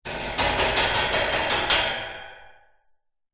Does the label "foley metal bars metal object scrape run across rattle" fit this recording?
Yes